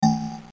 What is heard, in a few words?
DING